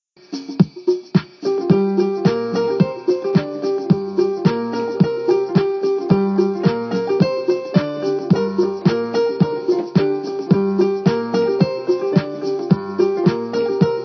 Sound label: bass